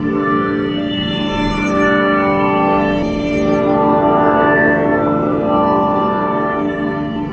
tuning orchestra atmosphere; LOOPING AMBIENCE

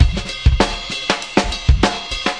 dynamic jungle, drum loops and breaks, 100 bpm; 091 to 100 bpm

